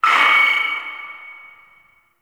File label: Announcement End; Lustratus; Interrogation